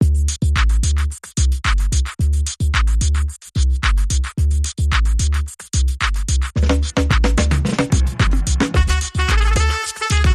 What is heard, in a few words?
Balkan Breakbeat